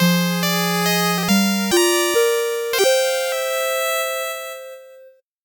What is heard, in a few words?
8bit jingle normal